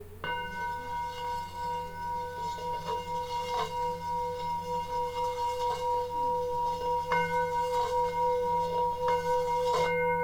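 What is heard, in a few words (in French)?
thick bol mi, bols chantants tibétains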